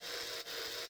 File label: inserter long handed; factorio; Factorio 0.14.21